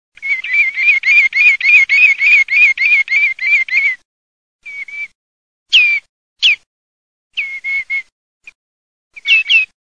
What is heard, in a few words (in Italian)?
GAMBETTA